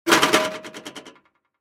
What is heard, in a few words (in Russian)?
neakkuratnoe obrashenie s bankoj kraski